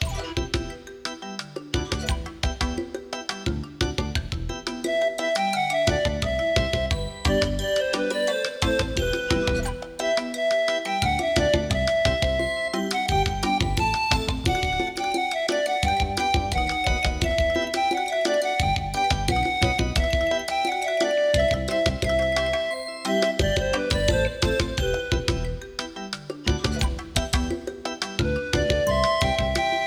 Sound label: AWE64 Legacy; Monkey Island Intro